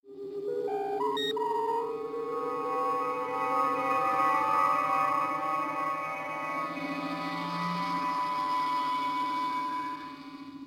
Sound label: Transporter Pad